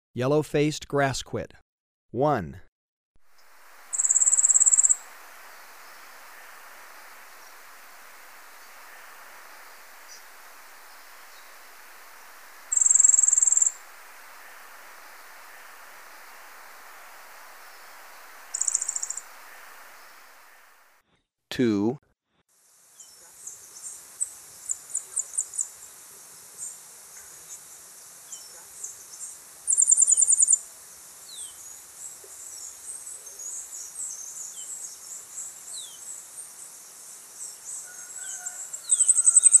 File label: Yellow-faced Grassquit, Birds of Ecuador; S. America